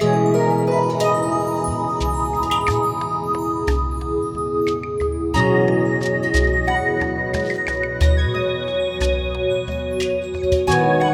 Win BGM; 7 Sexy Sins; Android

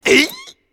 Character Knight Hit; Angry Birds Epic

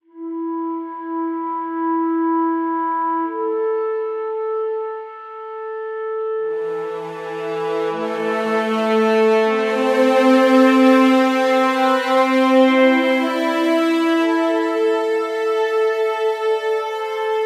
Cave Mid Hi Cor Clar

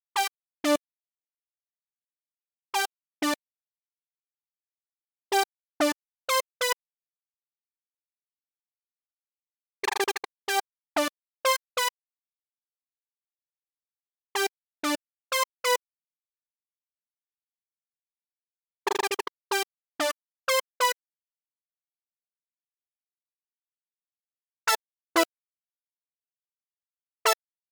BGM melody; Game Builder Garage

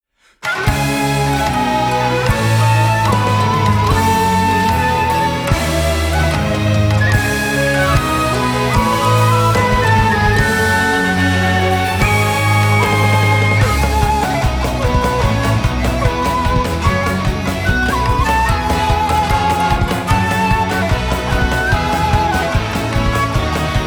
Bgm Battle; Kurokishi to Shiro no Maou; Android

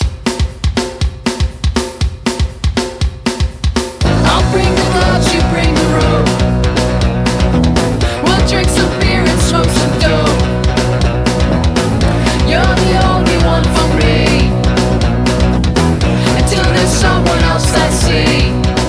punk rock; crossing souls; ps4

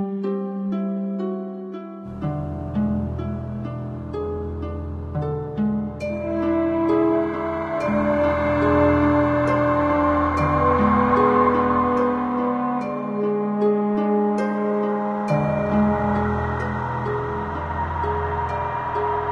Harp Loop

